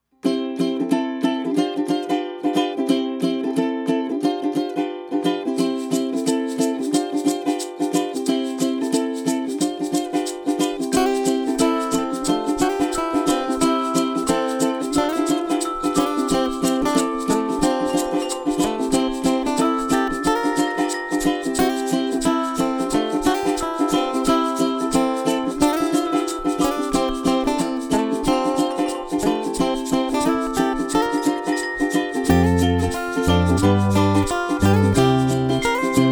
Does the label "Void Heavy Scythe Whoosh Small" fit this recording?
No